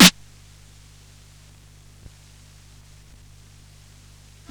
tape loop trigger

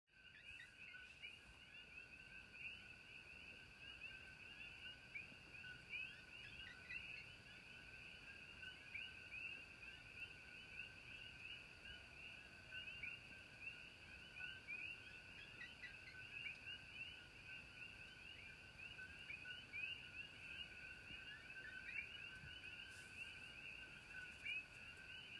twilight birds

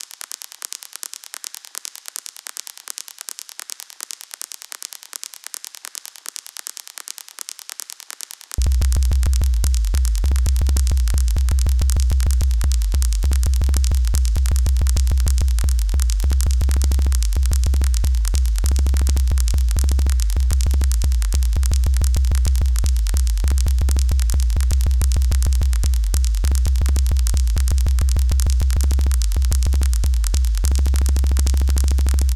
Glitch loop

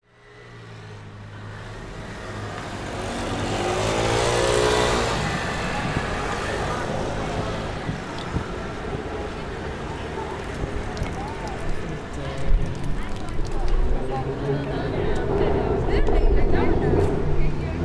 chinatown

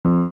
error

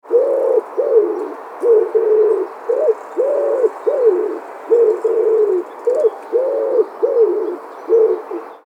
pigeons

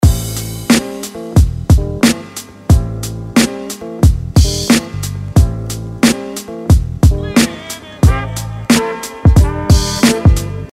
beat

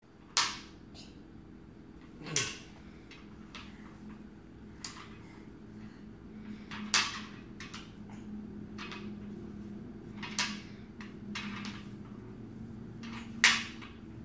toy

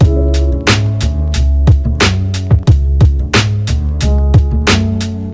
beat

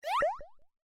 appear

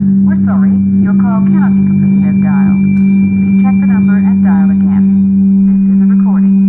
try again dial

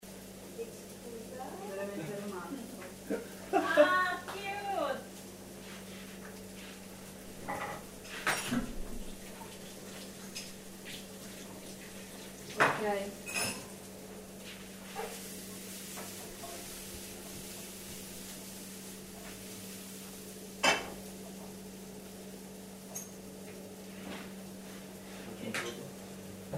super cute cooing sound

